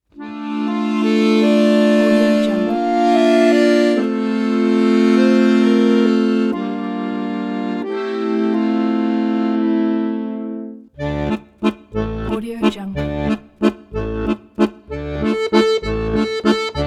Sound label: jewish folk song accordion solo